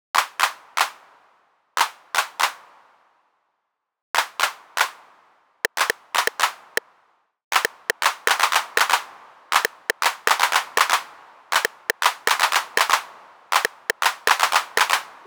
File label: Roland TR 08 Percussions